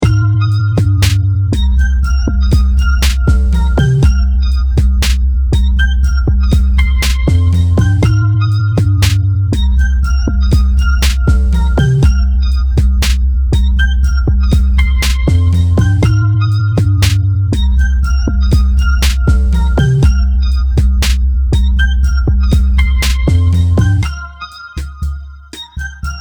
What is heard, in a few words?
instrumental